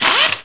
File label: RADIO OFF; mtm2trial